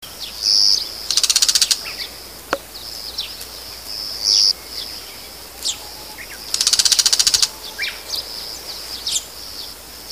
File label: mozu, birds